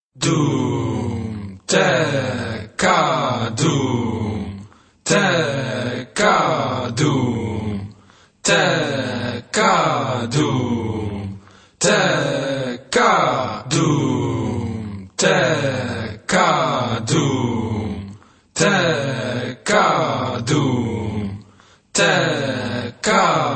Dum Tek Ka Vocals (slow)

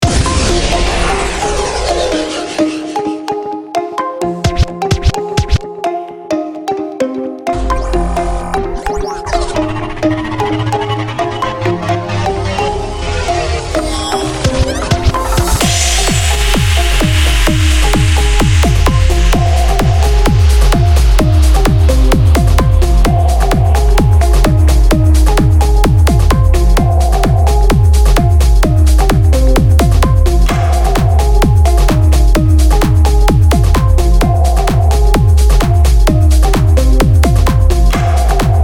turbo evolution

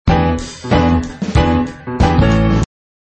drop trio; MagnaLoops

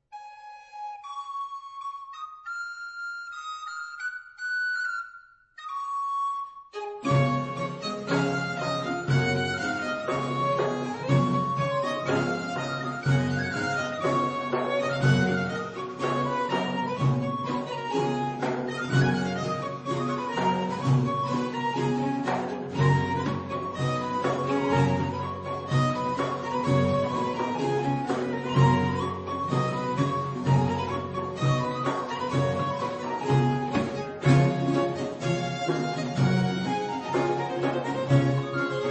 English Country Dance Traditional; Rufty Tufty

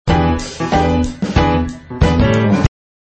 drop trio; MagnaLoops

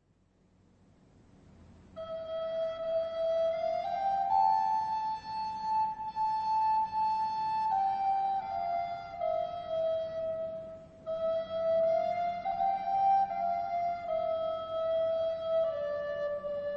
Lullaby; Irish

